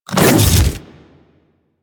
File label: Melee Swipe; Warframe; Infested; Grey Strain; Splitter